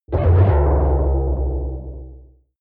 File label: protective shield hit absorption short, energie